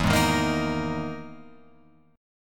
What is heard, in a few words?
E Major 7th Flat 5th, Guitar, Open F, EM7b5 chord; strummed; 4,3,3,5,6,5; JGuitar